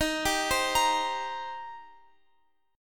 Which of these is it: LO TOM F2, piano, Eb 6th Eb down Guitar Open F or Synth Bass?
Eb 6th Eb down Guitar Open F